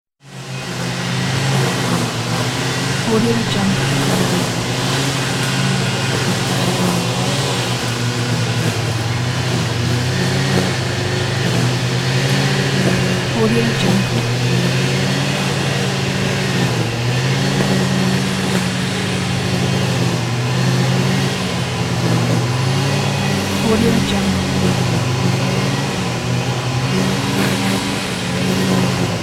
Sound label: lawn mower